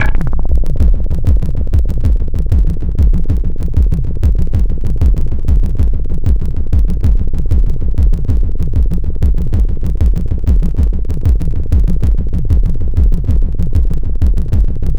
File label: Synth Bass; Bass X